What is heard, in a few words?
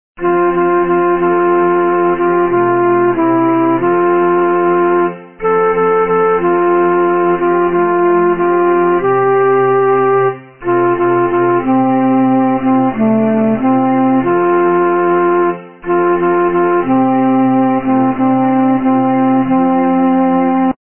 alto